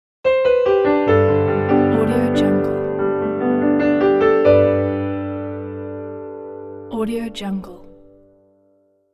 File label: piano logo; fortefx